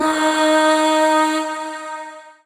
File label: Void Shawzin Oct Two D Sharp; Warframe; Zariman